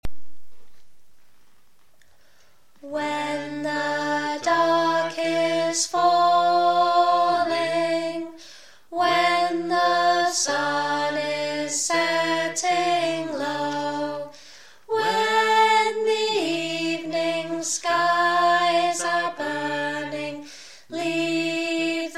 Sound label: Night song melody